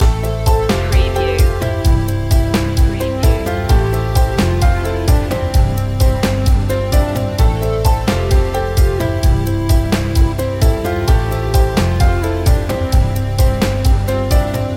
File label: cheerful inspiration loop